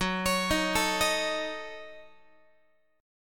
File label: F sharp Minor 6th F sharp Guitar; F#m6 arpeggiated; x,14,16,13,13,14